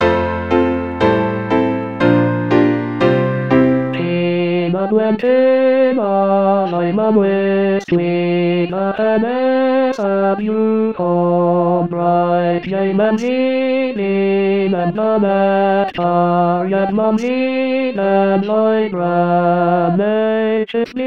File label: Tenor